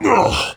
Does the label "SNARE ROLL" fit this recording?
No